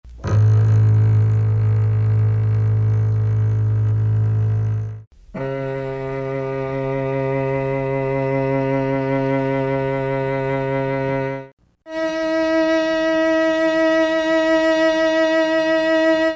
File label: cello